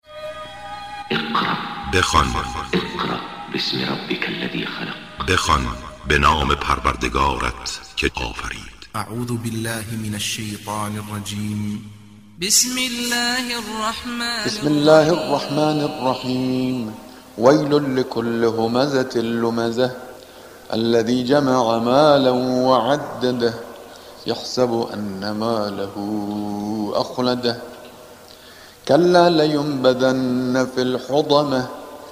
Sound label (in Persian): تلاوت سوره همزه